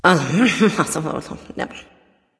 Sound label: grumbling; smite; voicepack; skadi